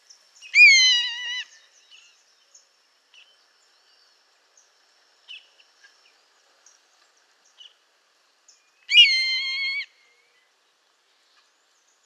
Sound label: Buteogallus meridionalis; Savannebuizerd; roofvogels; Accipitrinae; Accipitres; Accipitidae